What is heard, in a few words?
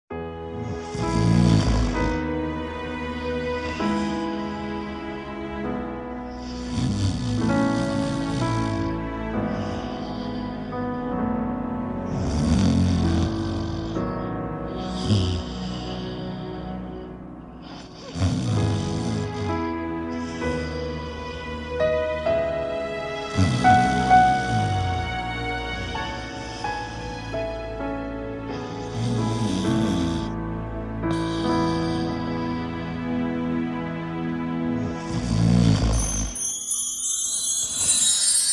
instrumental